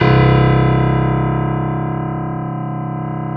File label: Grand piano C0 (R); Giga Samples Collection